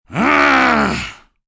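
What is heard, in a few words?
Growl Burly male, Growl, male, burly, animal grunt; Groaning Sound Effects; human voice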